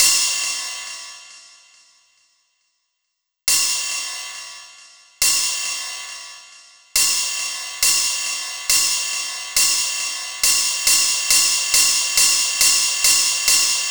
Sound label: Cymbal Loop, Dance Beats; Drums